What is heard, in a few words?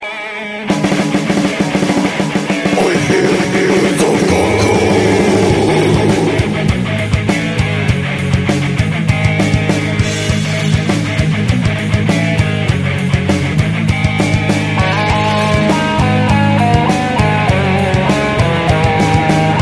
Melodic Metal